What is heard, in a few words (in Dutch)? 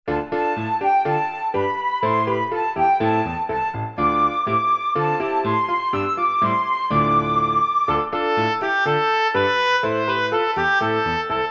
instrumentaal